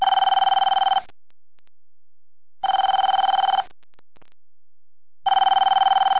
timbre telefono

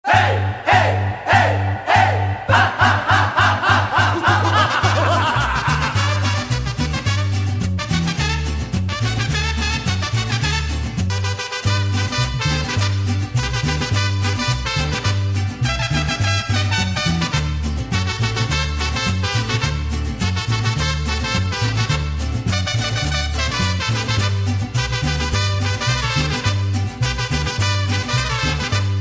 mariachi loco

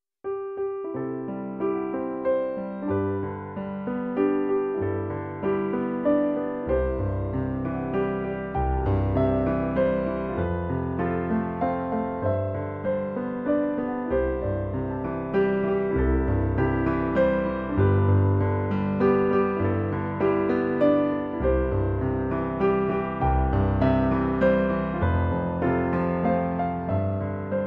feliz cumpleanos piano

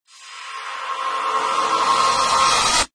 misterioso rapido

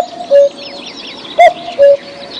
Mensaje de pasjaro, tono de llamada

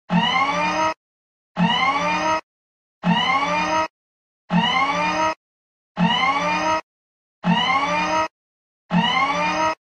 robot de alarma; tono de llamada

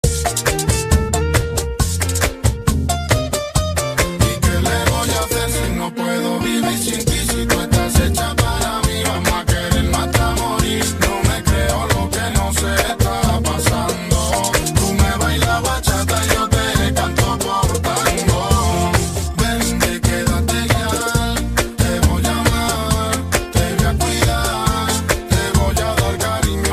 Flamenco Y Bachata

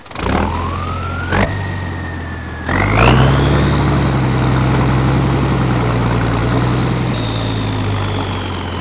ruido a bocina de un camion

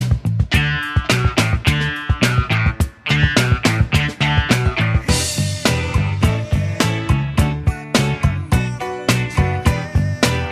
tono de llamada Vivo X60 Pro

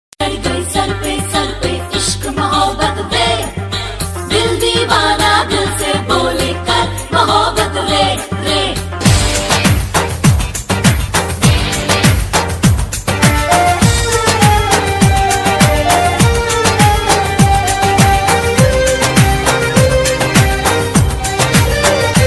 cancion arabe; tik tok

